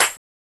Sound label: clap tambo ting; neuro; Sinistarr; Drums